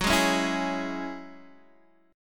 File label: Gb 7th Suspended 2nd Gb, Guitar Open D; chordshape; down